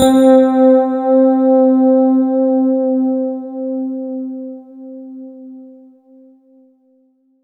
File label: C3, ANALOG SEQ; Roland JV 2080